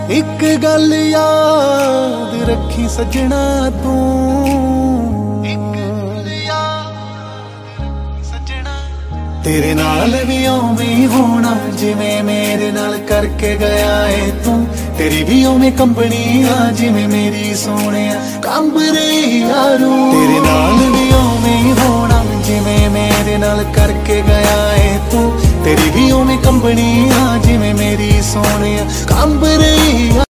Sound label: Sad Punjabi Song Ringtone